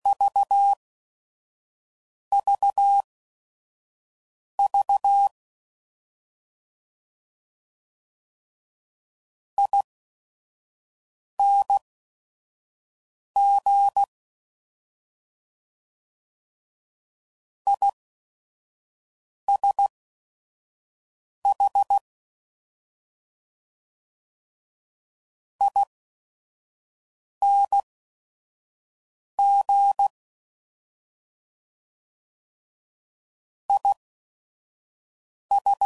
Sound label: Jumps, CW Code Groups 5WPM; Morse Code; HamRadio; Mixed